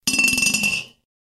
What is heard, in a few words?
Vas On Floor; Granny 3; Android